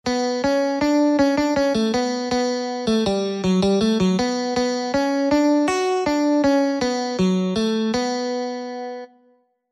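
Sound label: Intervals natural minor electric guitar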